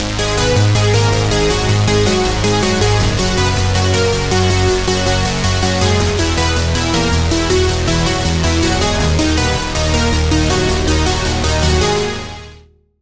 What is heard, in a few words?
Key; Routed Sound; Serum 2 Expansion - Reality Maker Vol.1